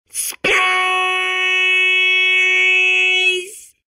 space

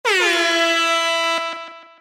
echo, airhorn

